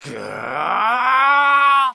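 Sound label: Mon Man ATTACK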